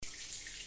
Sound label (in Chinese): 洗澡